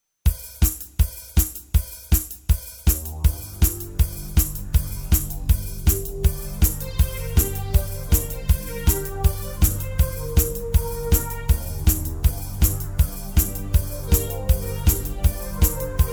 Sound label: Instrumental